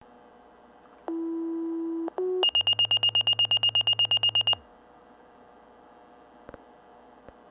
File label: Minitor V Tone